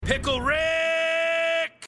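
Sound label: scream of someone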